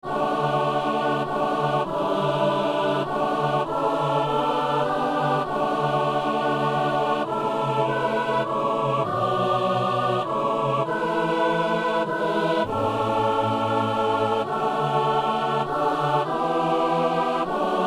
messe kyrie; messe de saint boniface

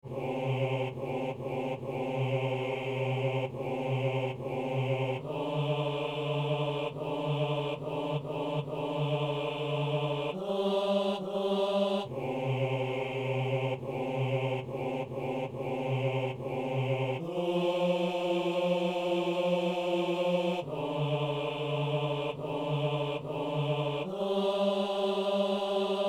basse; Christ est venu

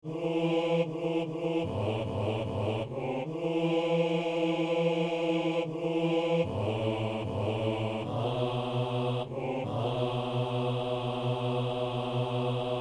basse; Par Jésus-Christ ressuscité (P.U.)

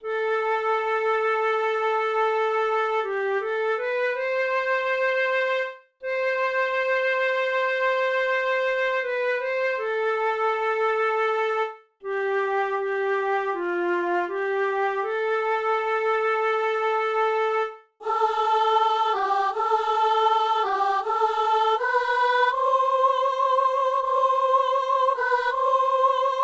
chantre et soprano; Messe de Saint Paul; Kyrie

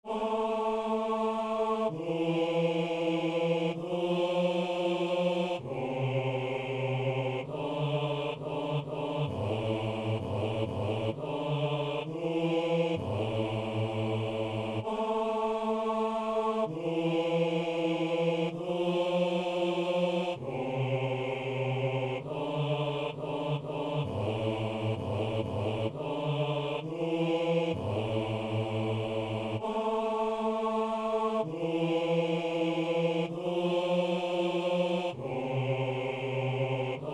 basse; Messe de l'assemblée - Agneau de Dieu

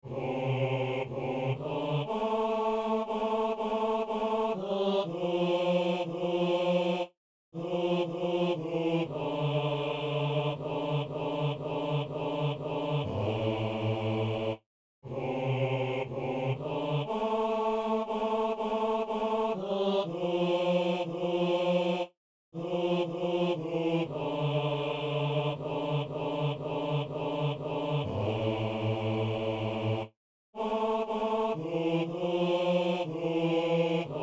basse

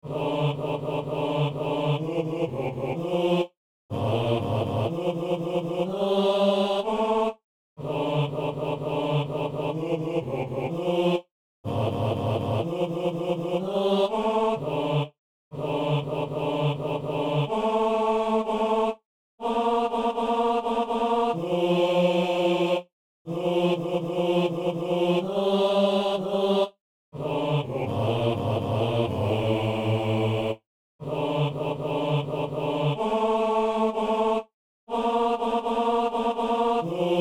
basse

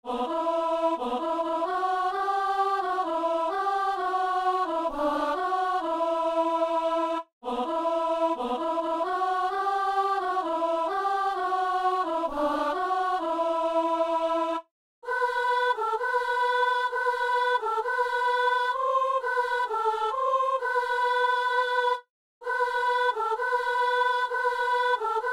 chant; Soprano

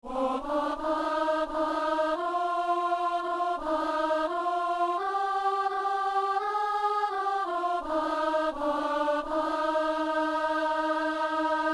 psaume, soprano; Messe du 17 janvier à Saint-Denis; Psaume 66 (67)

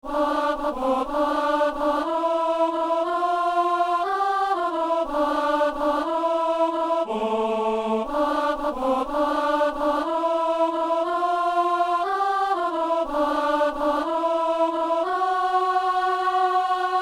messe simple alleluia alto

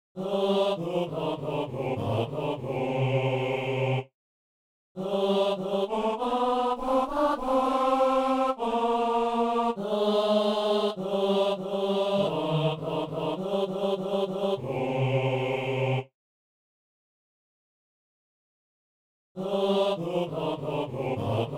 chante alleluia au seigneur, hommes

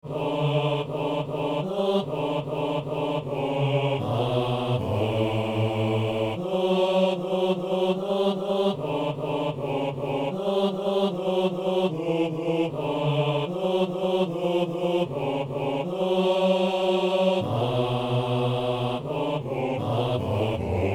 basse

